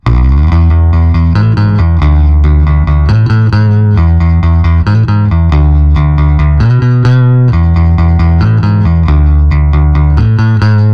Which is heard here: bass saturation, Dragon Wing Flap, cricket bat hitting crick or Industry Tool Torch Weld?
bass saturation